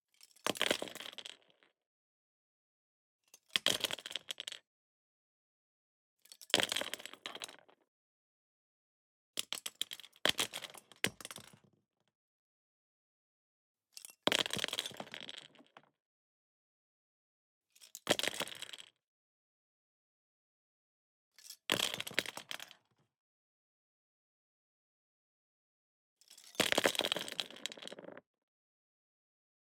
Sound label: Multiple objects fall; lilesound